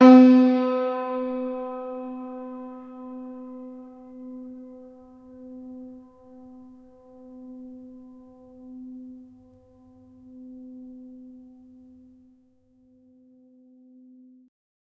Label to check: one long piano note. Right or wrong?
Right